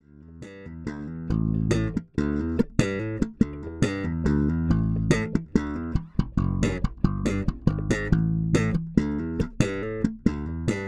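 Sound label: Sadowsky clean; MP40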